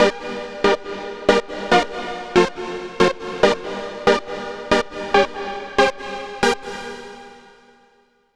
Unison Seq; Dr. Fink's Funk Factory

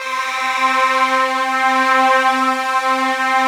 PAD, 170 BPM; Ueberschall; Drum & Bass Resonance

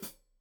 Hat Foot, Hi-Hat, Cymbals Stereo

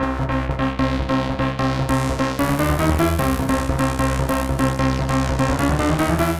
SYNC JUPITER; Masterbits - Tekkno Trax; SQ LOOP 150

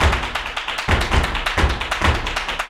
BULERIAS TAP, Flamenco

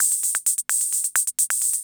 CR78

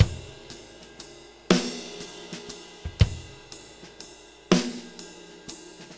6/8 Loop, Drum Tools, Laid Back

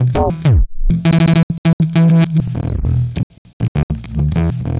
Processed Electric Bass

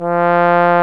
C Tuba

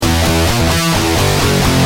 G Pump, Guitar; Industrial

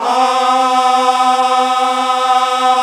GOSP. AAAHH; Sound & Vision - Gigapack II CD 1

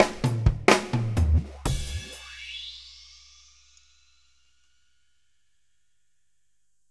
Fills, Monster Beats; Zero-G

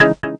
chord one shot delay C#, Classic Chicago House; Sample Magic; Chord Shots

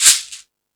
Timbale, E-MU Pro-Cussion; drum machine

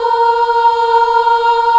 A#3 child ah, choir; USB Soundscan vol.28

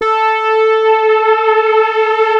FAT LEAD, Powered DX; Wizoo

